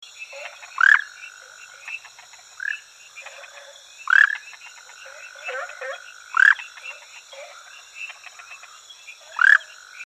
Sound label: Rhacophorus prasinatus, Tree frog of Taiwan; 翡翠樹蛙